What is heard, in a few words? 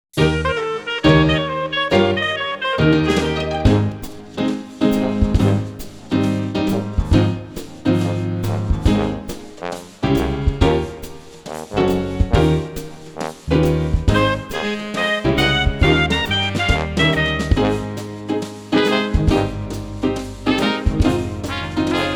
Children Karaoke Version